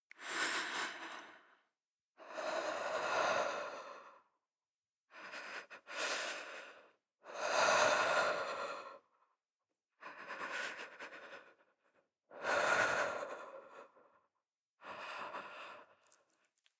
breath female shiver sequence

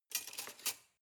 cutlery large drawer grab soft

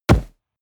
punch solid

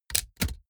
typewriter

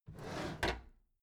packed drawer smooth clatter open regular halfway

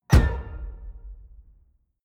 sci-fi damage zing horn high

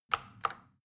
plastic button tiny click regular slow

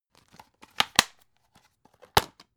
dvd case handle open close

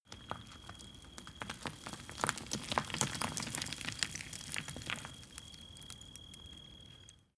stone small landslide

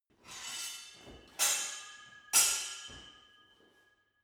sword hit sword sequence short

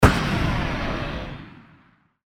explosion

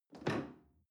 chair wood take soft

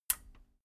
metal button radio push power on

